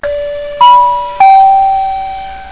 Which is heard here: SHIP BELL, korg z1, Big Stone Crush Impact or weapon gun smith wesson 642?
SHIP BELL